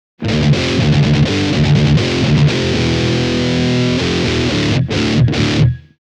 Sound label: od+ds mixer